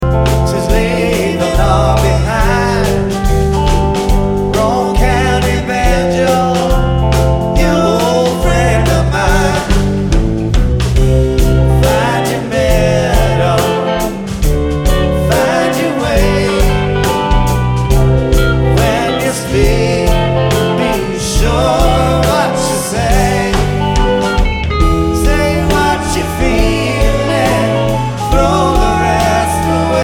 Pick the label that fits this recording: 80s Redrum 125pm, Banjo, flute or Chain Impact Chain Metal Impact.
Banjo